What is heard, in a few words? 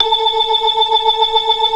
BLOOD OCT R, ORGAN